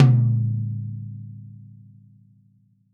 DW Green Maple Tom; Sonic Foundry (Sony Creative Software); Vital Drums

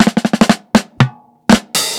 120 FILL, DRUMS LOOPS; BASEMENT DRUMS VOL 2

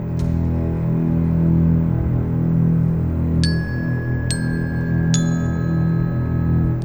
Cinematic Orchestra

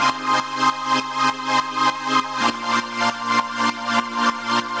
Pad 100 C, sidechained samples 100bpm; alesis 1:8

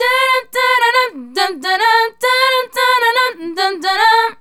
Female Group, Vocal; Sonic Foundry (Sony Creative Software); Vocal Toolbox

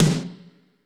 ROOM S, ROOM KITS; Crypton - DrumBase5000

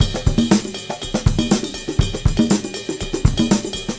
Combo, R&B Drums; Sonic Foundry (Sony Creative Software) - R&B Drums

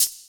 Shaker; Equipped Music; Loungin House

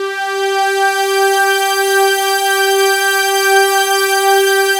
analog swell; Sound Gallery - Charged

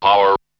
Vocal; ZERO G - Datafile DISC 2

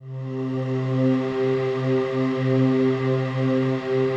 PAD, DIGI-PAD

